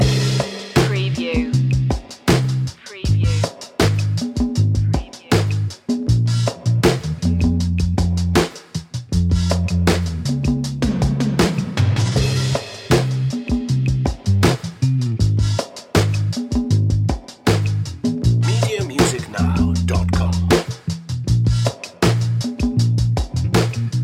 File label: drums and bass